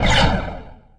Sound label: Laser Shot; xbox 360; gamerip; castleminer z